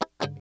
CHUCK KUH, FUNK CHOPS, guitar; Spectrasonics; Hans Zimmer Guitars VOL-1

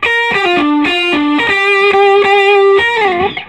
SOLO G, Vintage Blues Guitar

